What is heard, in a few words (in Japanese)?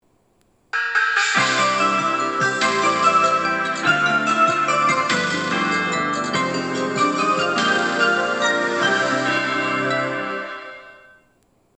長崎駅 発車メロディ のみ